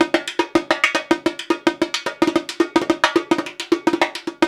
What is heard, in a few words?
108 Rio Batucada; Spectrasonics; Supreme Beats; World Dance